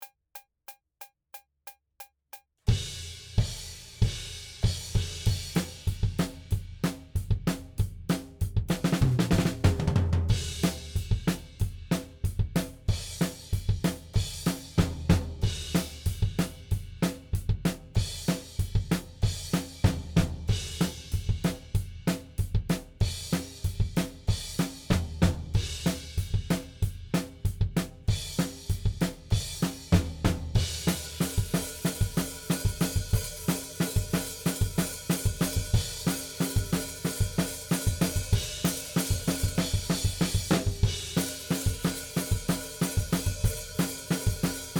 Drums ONLY; Pre Pro

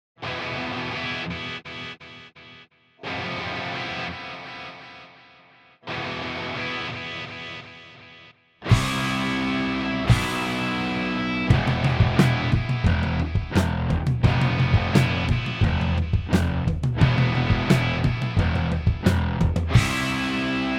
Instrumental Mix

